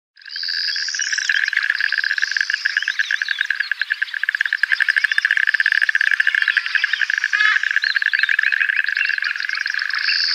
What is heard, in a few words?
White-headed Duck male display